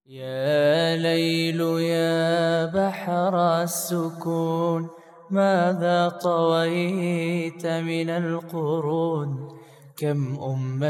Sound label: Vocals Only; nasheed